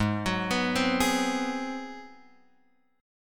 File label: Ab 7th Sharp 9th, Guitar Open F, chord; Ab7#9 arpeggiated; JGuitar